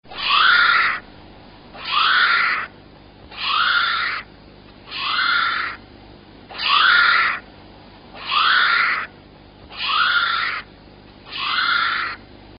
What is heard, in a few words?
brown kiwi female